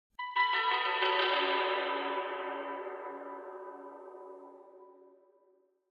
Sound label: Chinese Koto Arpeggio - Reverb; Hollywood Edge; sound designer's toolkit v2